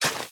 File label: shovel flatten; Minecraft